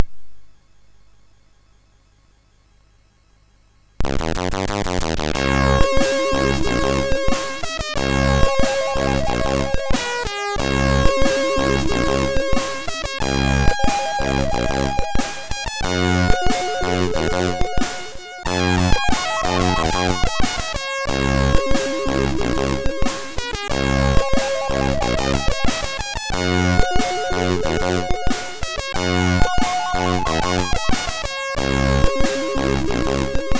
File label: Sad String, MOS6581R3